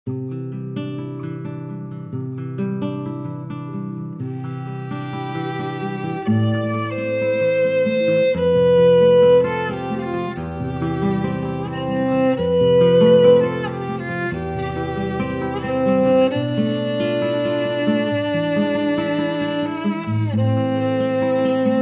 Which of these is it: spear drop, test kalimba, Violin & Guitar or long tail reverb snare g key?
Violin & Guitar